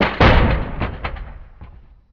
Door Large Metal Stop; SFX Kit; Doors

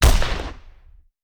Hand Gun; Sound Ideas; Guns

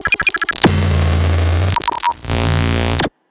Esthetic Noises; Plastic Surgery

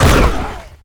Football Hit Tackle

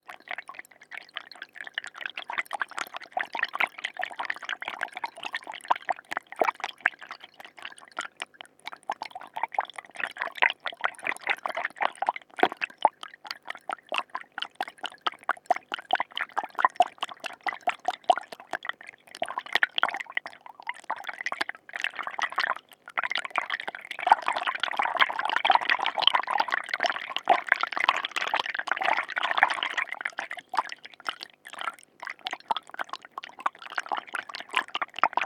stirring liquid; NS Studios - ultra sound collection

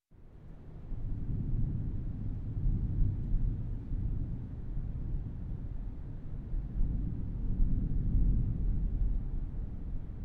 Thunder Rumbling In Distance; Sound Ideas 2000 Series

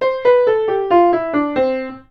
Piano Play Scale Down; SFX Kit; Foley - Misc

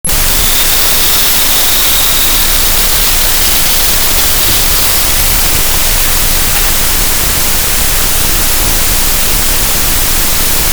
Door Air Lock Closing; 1001 Sound Effects; Foley

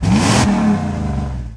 Truck Range Rover Rev; Trucks

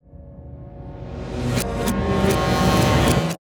Riser; Blastwave

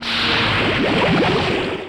Pickup Underwater O2 Tank; SFX Kit; Pickups

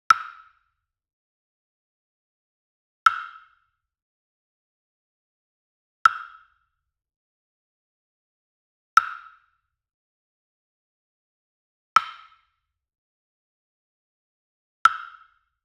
Wood Percussion Wood Block Standard Hit Near; boom library; Cinematic Strikes Construction Kit

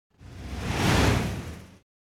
Whoosh By Air Classic Passing; Hollywood Edge; sound designer's toolkit v2

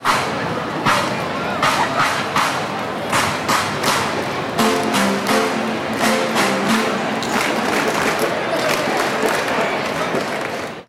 Baseball Organ Crowd Claps; Crowds

